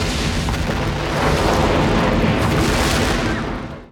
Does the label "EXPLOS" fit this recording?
Yes